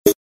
ui smooth

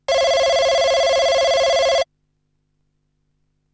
Digiphone; BenQ-Siemens; EL71

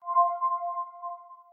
lighting door; LG G Flex

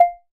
Electronic, notifications

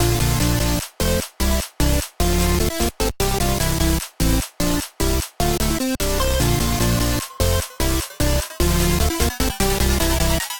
beach jam, ringtones; Google; Google Sounds; Play It Loud

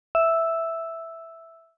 Ring, notifications; Motorola Moto-G20; lenovo

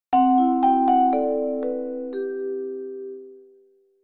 Notify Ring; Okapia Air

